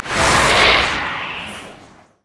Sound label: teemo move quick; LoL; super teemo